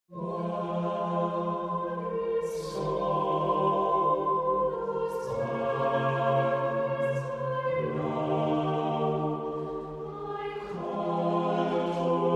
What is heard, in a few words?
for Choir; Hungarian Dance No 1 WoO 1